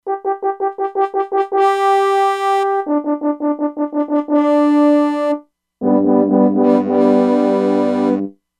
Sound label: Horn, E-mu Proteus-2; Factory Presets